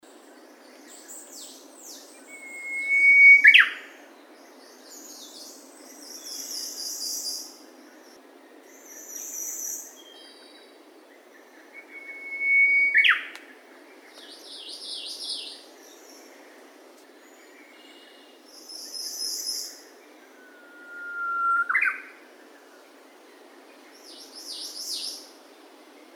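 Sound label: bamboo warbler, Cettia diphone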